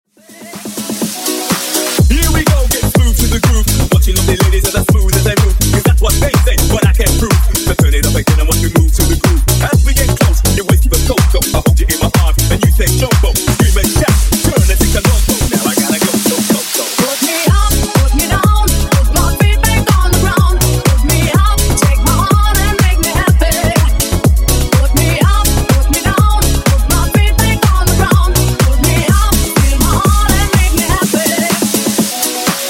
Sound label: Disko Funk Remix 124 bpm